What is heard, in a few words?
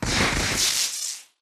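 impact bullet energy titan shield arc; Titanfall 2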